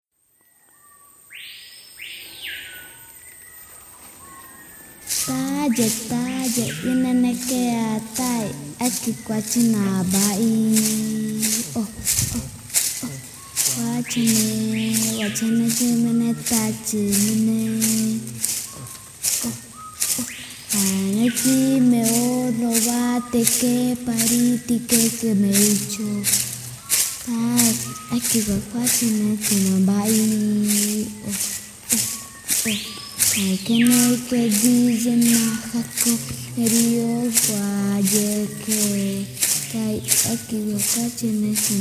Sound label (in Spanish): CANTO BORA